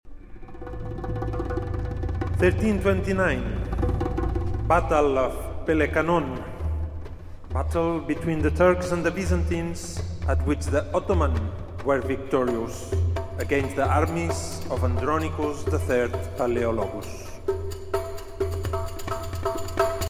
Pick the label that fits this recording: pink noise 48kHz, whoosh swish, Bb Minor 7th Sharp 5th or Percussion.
Percussion